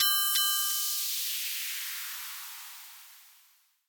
freespin start; Amatic; Fortune Girl

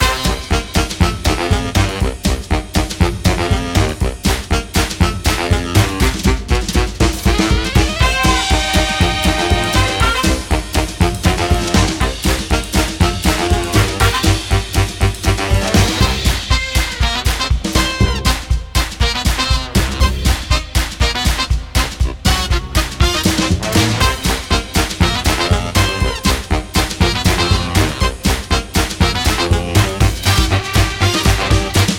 freespin melody; Amatic; Wild Shark

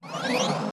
panel in; Amatic; Hot Diamonds

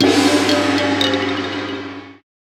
free spin init; Amatic; Dragons Pearl

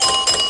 collect end, slot; Amatic; Lucky Little Devil

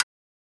select tick; Amatic; Book of Fruits

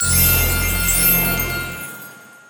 freespin init; Amatic; Fruit Box

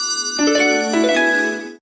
win; Amatic; Casanova

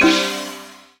stop super Symbol; Amatic; Big Panda

